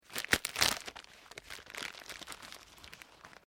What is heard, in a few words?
PLASTIC WRAPPER OPEN; Various kitchen appliances; Materials